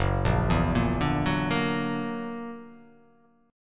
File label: F sharp Augmented 7th F sharp, chord